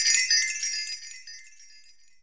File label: ROLAND SPD-20 PERC TABLA; ETHNIC and WORLD PERCUSSION